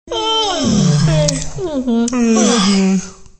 yawns; jungle jiggy

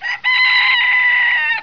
fowl

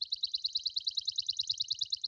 birds; Arcanum

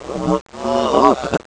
DIE; Graliens

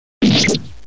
KATANA; KARUTA

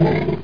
BOAR MOVE; landslor

